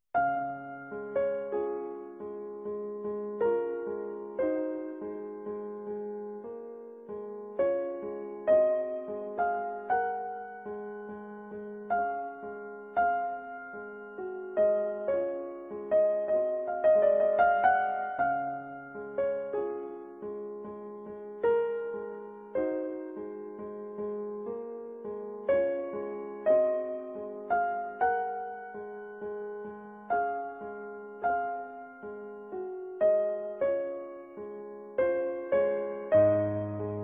Chopin - Raindrop Prelude

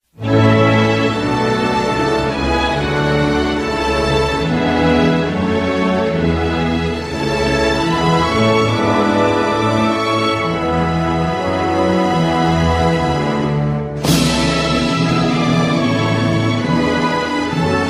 South Korean anthem

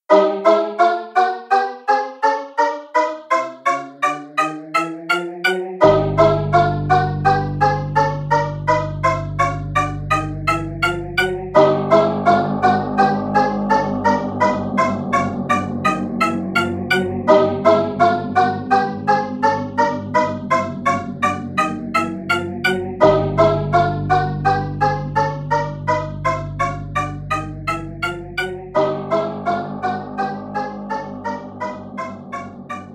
Tension

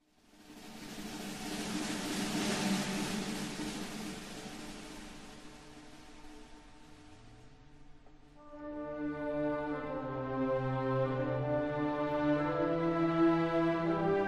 Japanese anthem

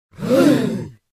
gasp; BFDI

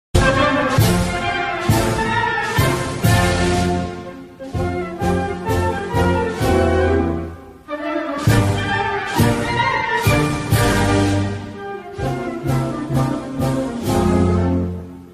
Brazilian anthem; new version used in 2022 world cup

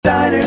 Diner

